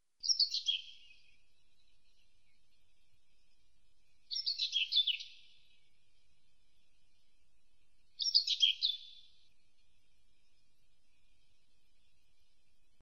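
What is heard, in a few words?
seraya slavka voice, common whitethroat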